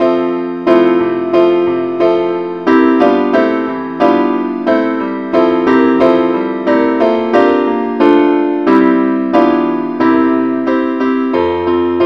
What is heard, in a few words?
keyboard key f; saintthomas